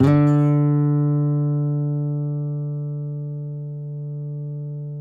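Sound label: nylon, FLAMENCO, Guitars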